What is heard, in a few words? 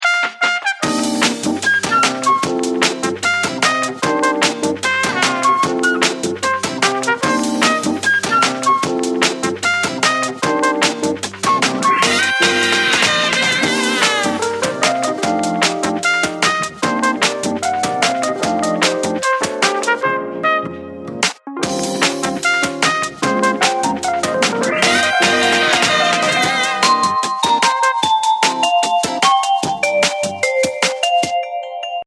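Soul Samba, ringtone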